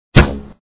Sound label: launch arrow; Raft Wars